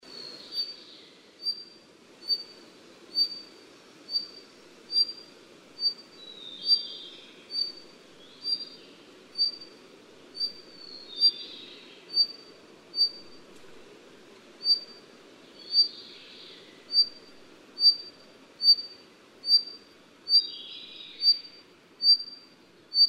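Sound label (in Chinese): 白尾鴝, Yushan National Park; 白尾藍地鴝; 鶲科/留鳥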